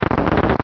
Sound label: enemy awake; zsdx